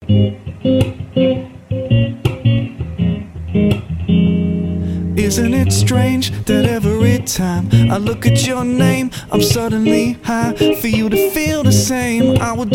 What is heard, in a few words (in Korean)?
영어남 노래; 남자